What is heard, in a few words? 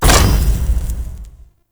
Scrap Container Open; Warframe; Missions; Zariman; Zariman Assassinate